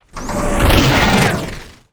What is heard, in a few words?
wooshes transform sweetener